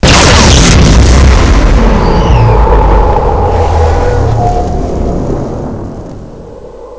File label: ion cannon attack